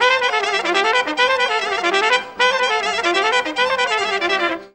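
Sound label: clarinet trumpet; Best Service; World Colours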